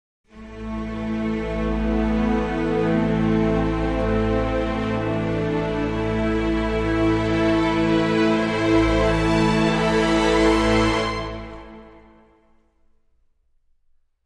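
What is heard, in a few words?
Dramatic Sting, Orchestra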